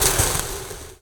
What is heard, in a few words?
Drip Cauldron Molten Sizzle; SFX Kit; Foley - Misc